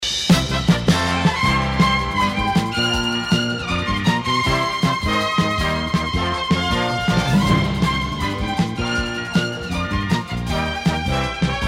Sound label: news close; radio band of america